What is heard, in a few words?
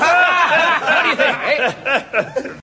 multiple men laughter